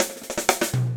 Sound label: 122 Funky Drums Snarefill; R&B Funk Soul